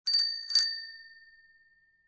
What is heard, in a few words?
BELL BICYCLE